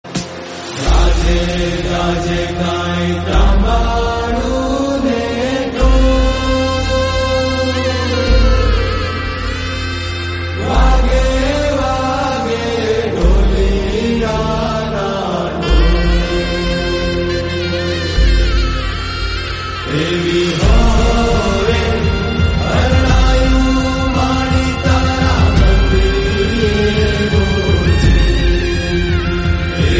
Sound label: gujarati song